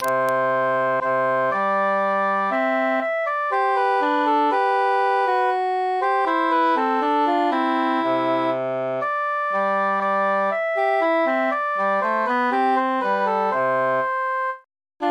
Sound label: tenor mute; se la face